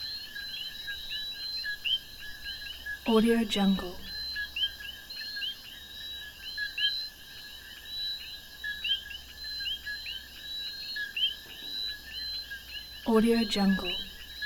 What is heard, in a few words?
jungle frogs